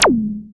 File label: FX A, ANALOG; Sound & Vision - Gigapack II